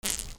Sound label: BATHROOM